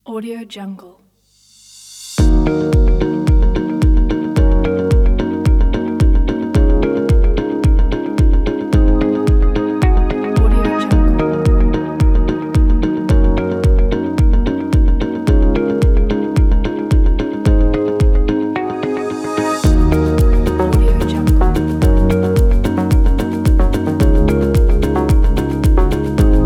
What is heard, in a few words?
tech